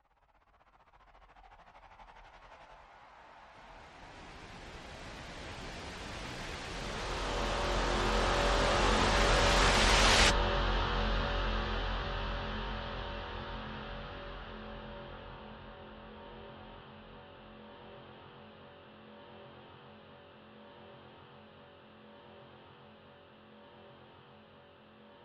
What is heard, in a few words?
Rises Noises